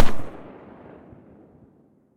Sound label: galil silencer mono far